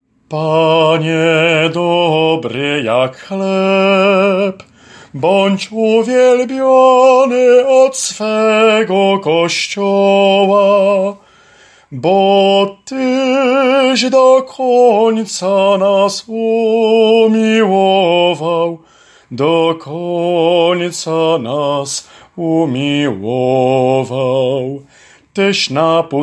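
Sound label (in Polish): Panie dobry jak chleb SOPRAN, choir soprano part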